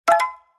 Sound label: ping